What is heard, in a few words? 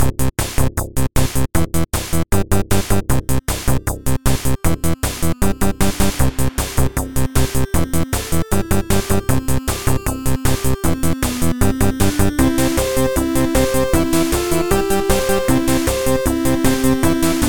Groove